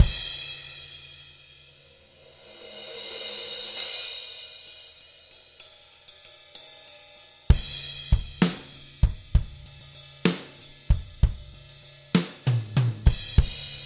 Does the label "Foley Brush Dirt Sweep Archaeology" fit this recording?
No